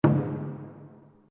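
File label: Drum Taiko mezzo forte, Japanese Taiko Drum; Miscellaneous Musical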